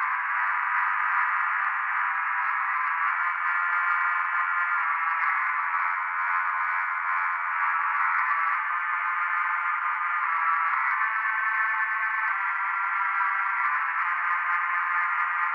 strings; terminator earcandy; synth loops

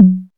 natural tom sound f sharp key; tom drum samples

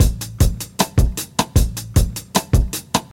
77 bpm hq drum groove a key; drum loop

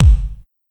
ambient kick drum single shot g key

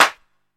short reverb snare drum f sharp key

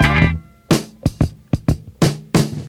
90 bpm high quality breakbeat D key

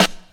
old school good hip hop snare f sharp key

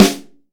deep acoustic snare sound g key; Mono; snare drum samples

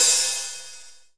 Long Tail Reverb Cymbal Sound Sample A# Key; Duration 0:01; tuned cymbal samples

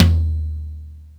high tom drum d sharp key; Bitdepth 24; Channels Mono

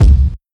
puffy bass drum sound c sharp key; kick drum samples

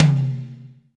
high tom one shot c sharp key

